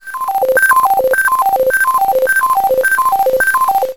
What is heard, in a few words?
science fiction console system incoming message alert